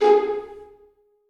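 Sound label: 1st violins pizzicato G4; Sonatina Symphonic Orchestra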